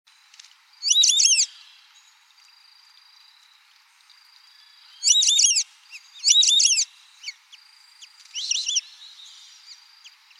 canto do irere

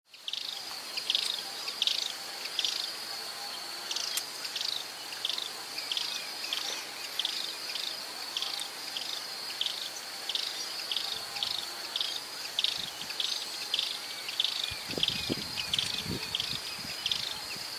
Canto do cochicho

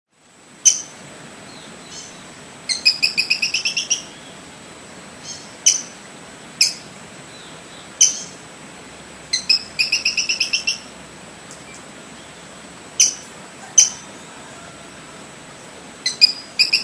canto do catraca

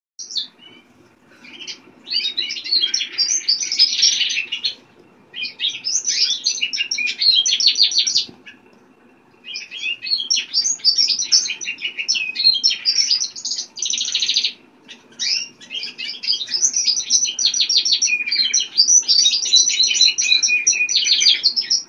canto do canario hoso japones

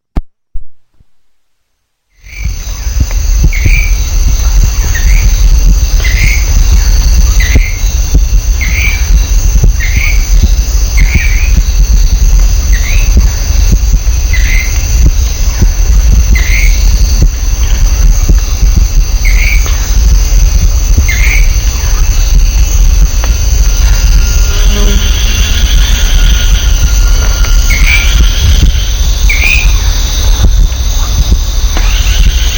canto do dancador de coroa dourada

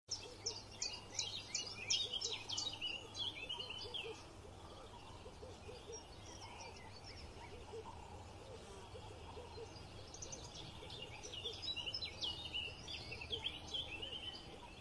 canto do tecelao de cabeca branca

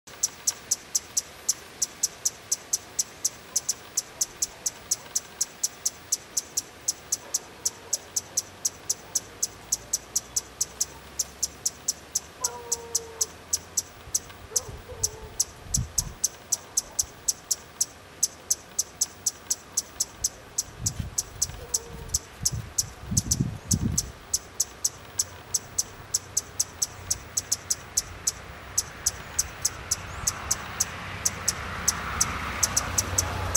CANTO DO BEIJA FLOR; Canon T2i

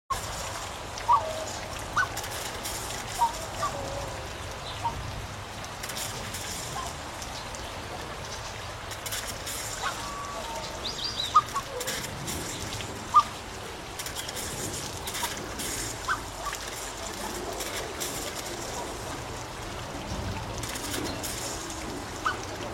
Canto do pato ruivo africano

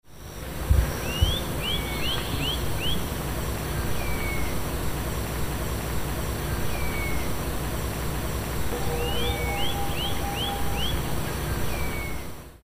canto do caneleiro da guiana

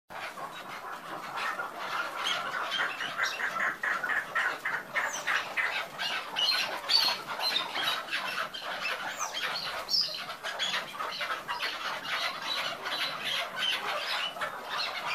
canto do perdiz chucar

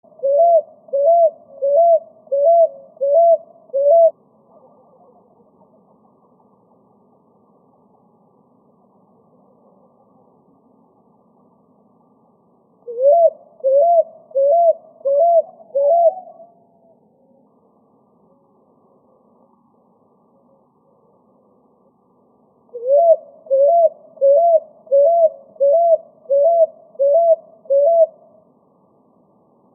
canto da rolinha picui

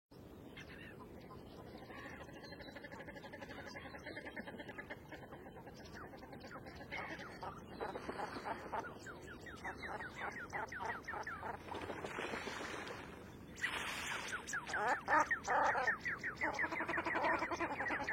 Canto da tadorna comum

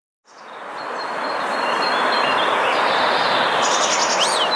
canto da trepadeira azul

